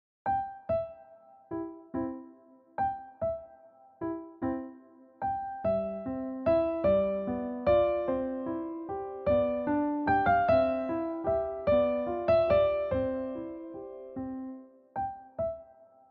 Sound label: waltz; august eberhard; the cuckoo waltz valse coucou kuckuckswalzer